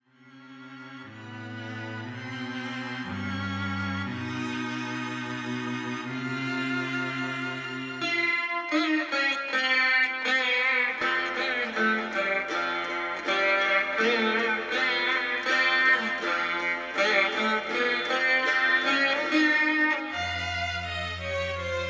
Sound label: Symphony in Raga Rishabapriya